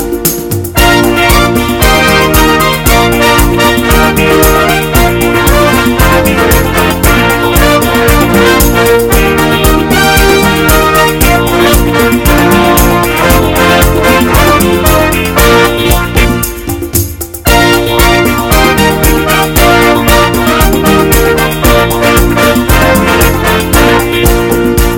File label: A Hot Pop